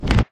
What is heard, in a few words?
trade single